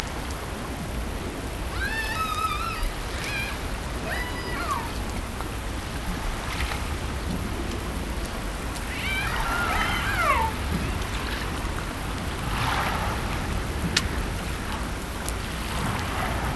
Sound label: Hydrophones, orcasound